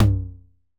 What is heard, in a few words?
perc classic tom low